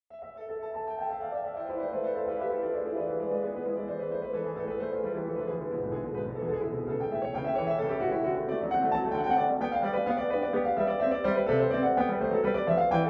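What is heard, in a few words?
Bach in empty hall, Pianoteq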